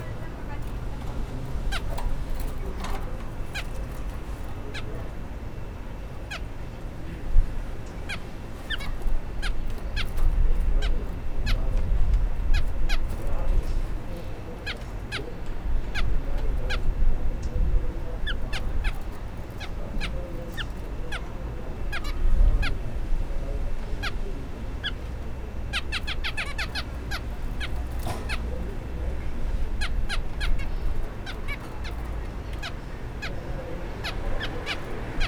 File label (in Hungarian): bolt előtt kalitkában madár közelről